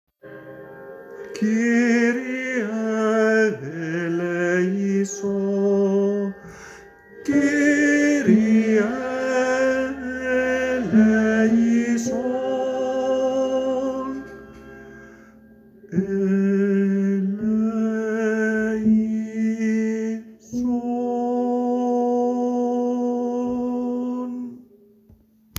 Tenore

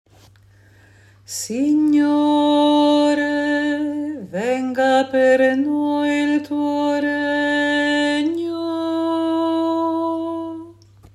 acclamazione finale Contralti; Signore Vieni; coro diocesano

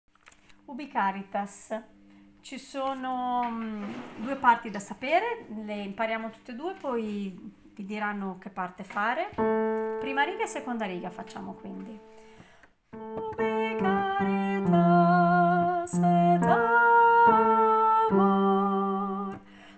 Ubi Caritas soprani

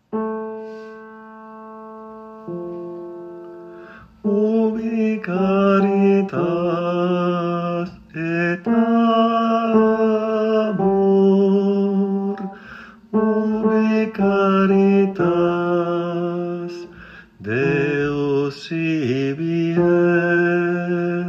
Ubi caritas tenore

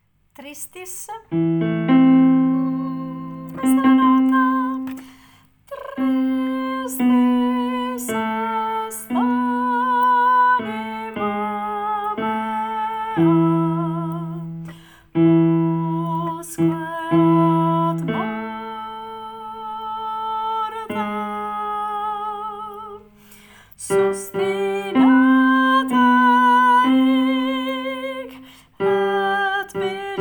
tristis soprani; Perosi

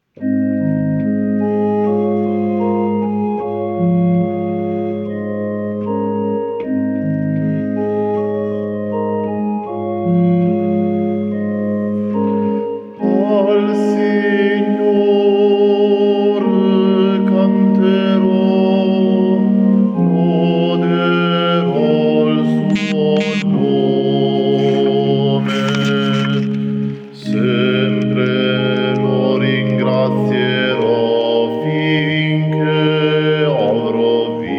bassi; Al Signore cantero

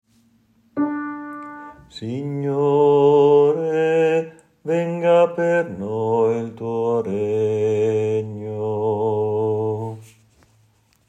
Signore Vieni acclamazione finale Bassi; coro diocesano

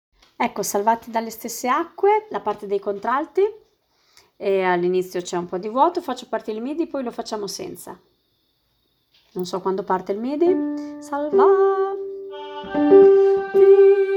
Salvati dalle stesse acque contralto; coro diocesano

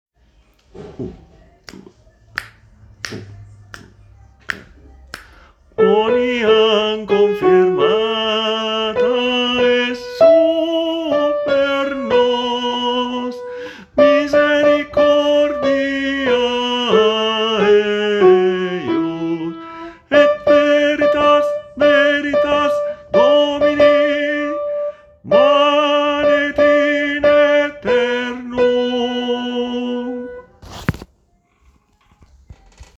Tenore; Laudate 2

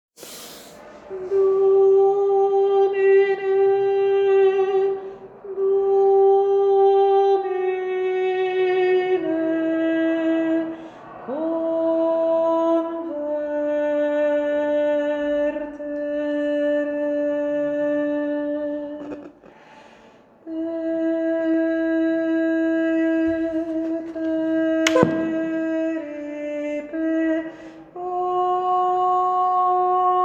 parte contralto

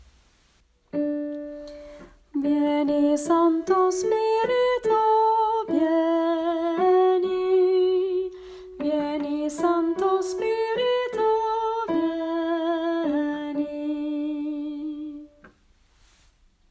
Soprani; Vieni Santo Spirito; coro diocesano

